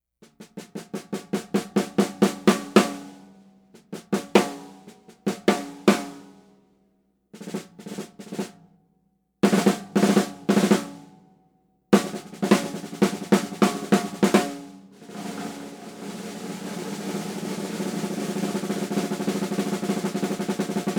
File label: Ludwig Supra 6514